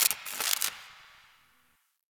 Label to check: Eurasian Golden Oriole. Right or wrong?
Wrong